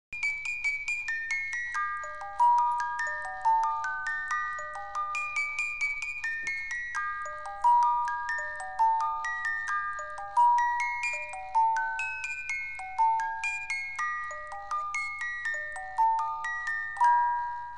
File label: Für Elise, Music Box